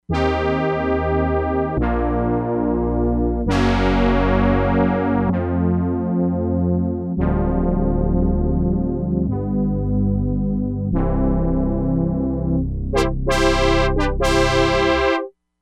SLOW BRASS, Crumar Bit 99; Factory Programs

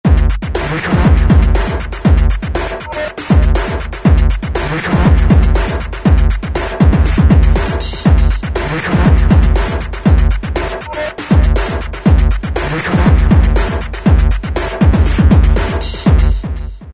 Korg ES-1 Factory Patterns; Can We Come Up

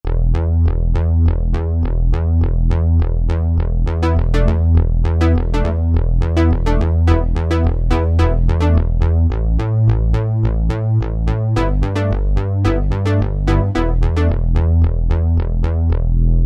Analog Bass, synth

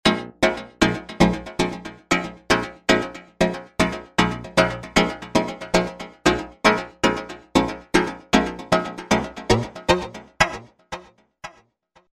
Chord arp, Roland V-Synth; Factory Patches; 130 SBF Chordarp

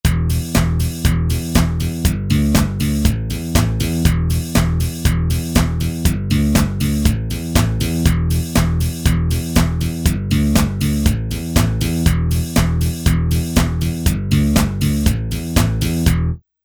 Pop

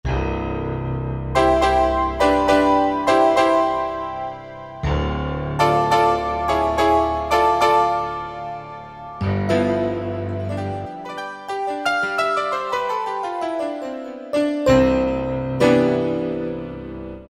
Six Pianos; Optical Media International Sonic Images Library

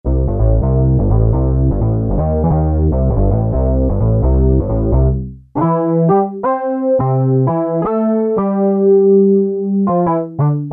Kawai SX-240

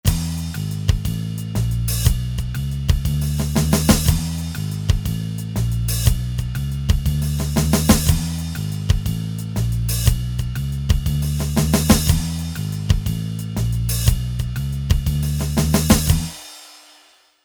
Blues

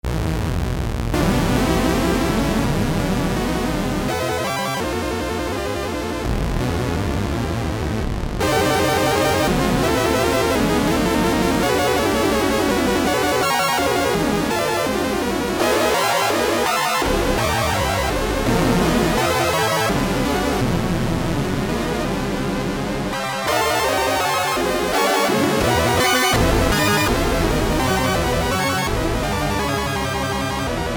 Roland Jupiter-8; MUSIC OF THE SPHERES; Factory patches

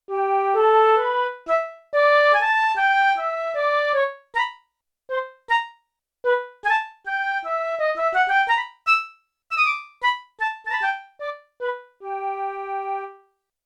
Flute & Piccolo; Yamaha TX16W

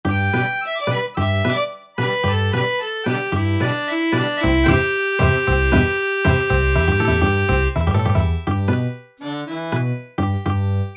Hillbillys

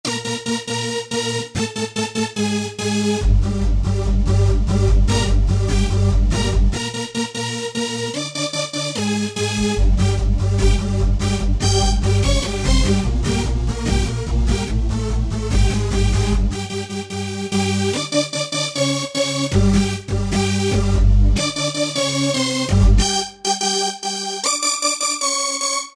Rave at home, Roland SR-JV80-06 Dance

